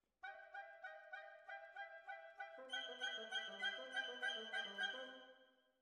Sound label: Violas, jazz for piano